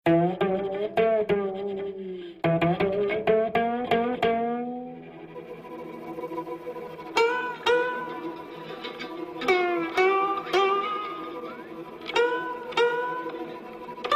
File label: Music break